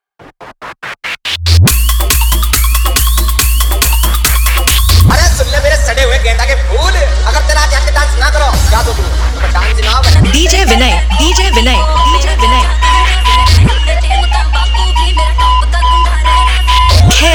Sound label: Edm Competition Remix